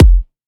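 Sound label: Kick, F minor; Sample Banks; Percussion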